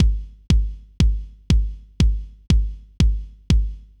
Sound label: kick 909; hydrogen